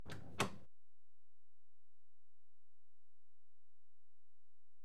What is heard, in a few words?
Carriage Doors Open; BBC Sound Effects Library; Age Of Steam